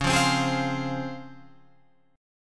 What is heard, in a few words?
Db Major 7th Db down Guitar Open F; strummed